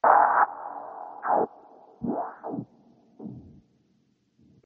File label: Sinus glitches; gridtracker